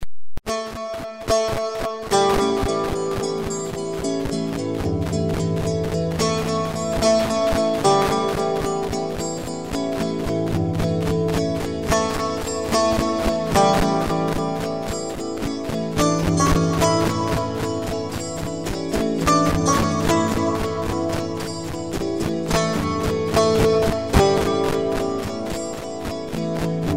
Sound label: Alap